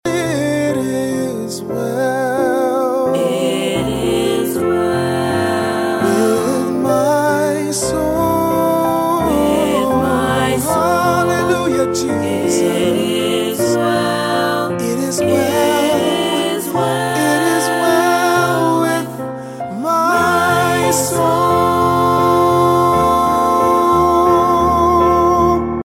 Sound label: ring tone